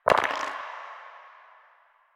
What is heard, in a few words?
Dice Roll; Warframe; Koumei